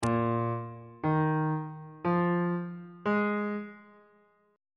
a sharp dominant 7th suspended 4th chord intervals on bass clef